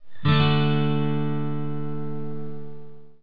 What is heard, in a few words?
d chord, guitar